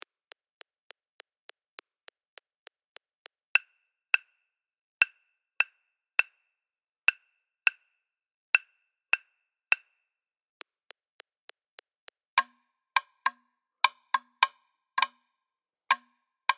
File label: Rumba Colombia 102bpm; Lateinamerika